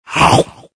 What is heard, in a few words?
monster skill; croods